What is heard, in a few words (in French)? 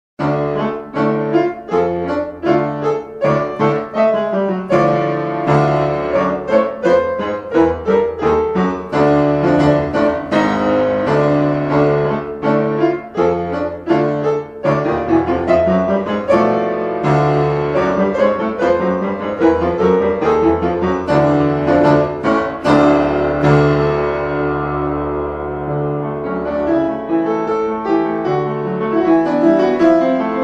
PRELUDE 14 EN RE MINEUR